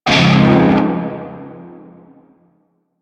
Shawzin Phrygian Chord F; Warframe; Narmer Shawzin

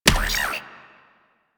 Drone Death

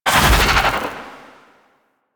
Fairy Flight End Cast; DE; Warframe

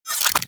Warfan Unequip; Warframe; Weapons; Melee

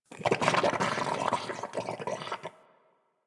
Goop Thick Burst; Ambience; Misc Outdoor; Warframe; Infested Eris

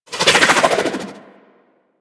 SMG Projectile By; Weapons; Warframe; Tenno; Sundial

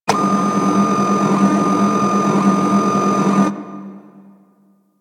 Ship Turret Move C; Player Path Beam; Fomorian

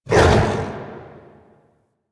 Axe Weapon Swing; Warframe; Tenno; Wolf Frame

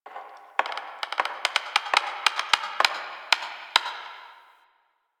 Tree Creak; Ambience; One Offs; Warframe; Quests; The New War; Drifter Camp

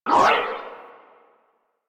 Universe Ring Cast; Warframe; Warframes; Nezha

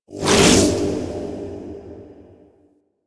Thurible Start; Warframe; Priest

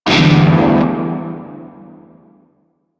Shawzin Maj Chord E; Music; Warframe; Narmer Shawzin

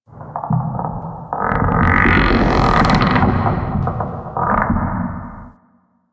Tentacle Creak G; Ambience; Interior; Warframe; Orokin

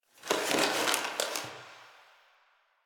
Box Of Trash Rustle

